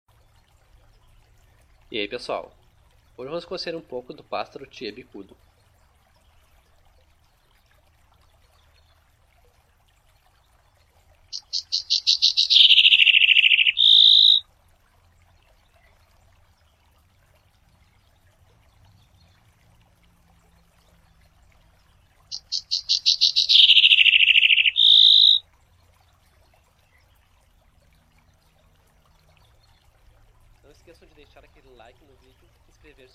canto do tie bicudo

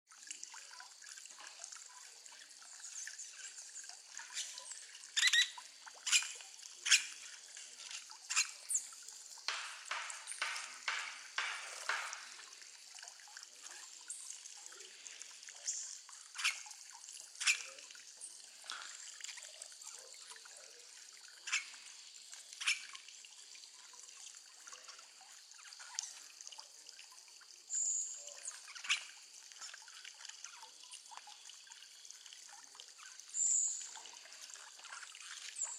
canto do pica pau do mato virgem

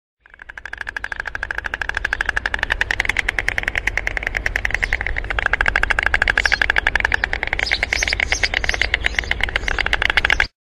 canto da cegonha branca

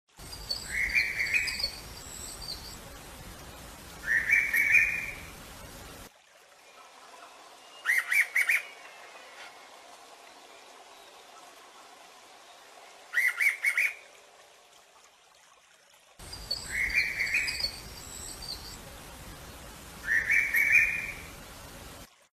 Canto do pitta arco iris

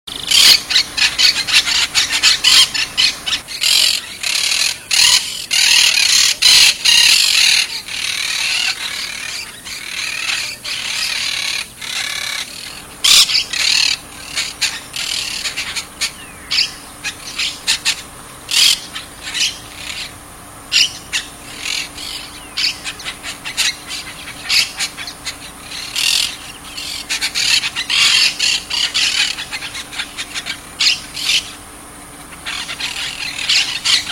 canto de caturrita

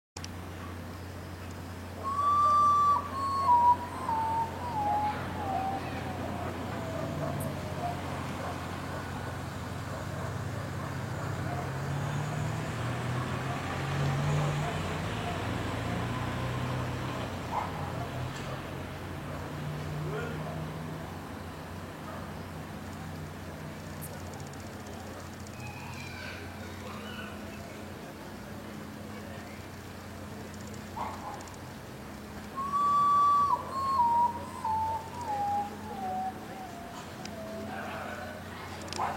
canto do urutau

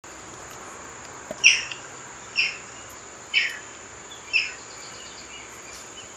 socozinho canto

